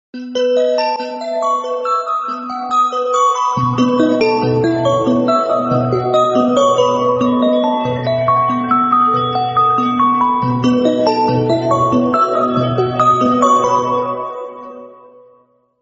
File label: Nokia Conjunction, ringtone